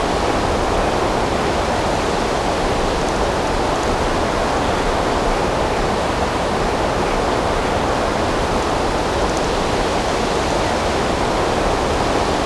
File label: Amb Weather; Red Orchestra 41-45